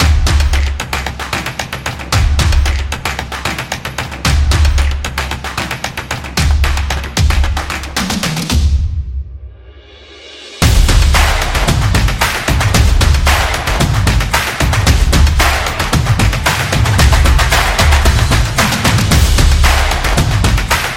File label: The Drumming